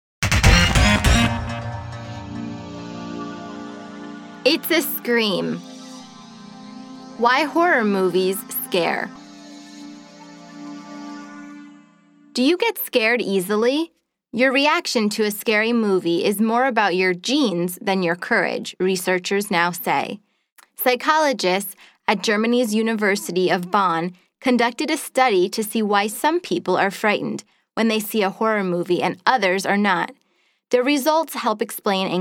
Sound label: scream; Hot English magazine